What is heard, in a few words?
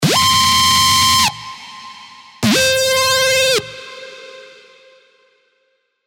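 lead, Skreechy, serum; GOLD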